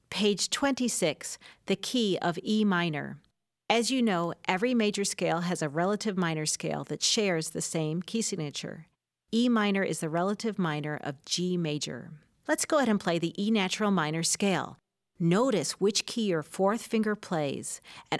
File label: E Minor Natural Harmonic And Melodic Scales